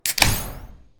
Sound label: booster